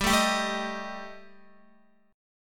G Suspended 2nd Sharp 5th G, Guitar, 4F, 4A, 5C, 5G, 5C, 6E; strummed; x,10,9,12,9,11